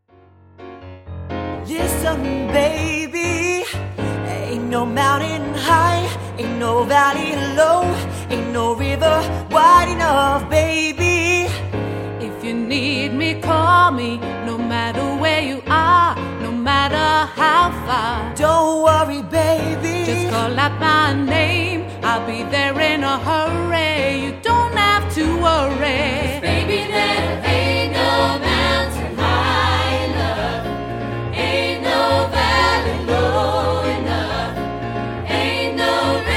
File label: gospel choir; funky